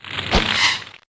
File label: Crossbow Fire Miss; The Battle for Wesnoth